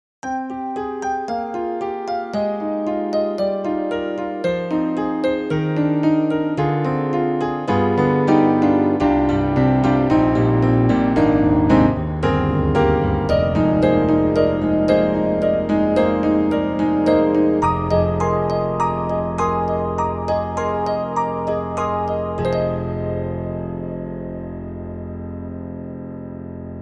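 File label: YAMAHA MOTIF-RACK XS Ballad Stack; Bllad Stack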